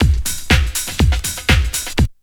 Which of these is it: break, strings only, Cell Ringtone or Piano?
break